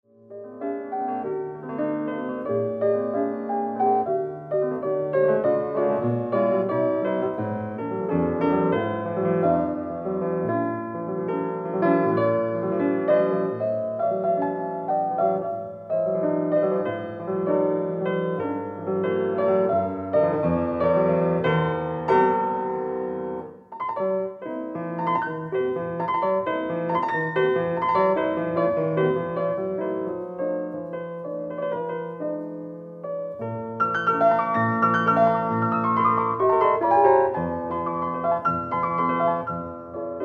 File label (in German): Klavier Klassisch Chopin